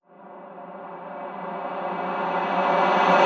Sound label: reverse, vocal sample